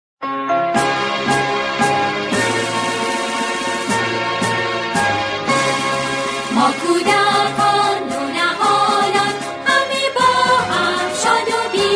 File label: Happy children’s song